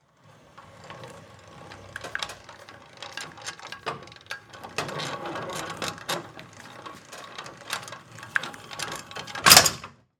Jet Ski Trailer, Tongue, Jack, Turn, Attach, To, Truck; Transportation, Watercraft; Boat